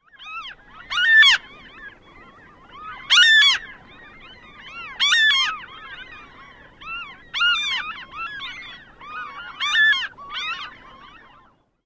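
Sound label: RIBIGU calls num 3, ring-billed gull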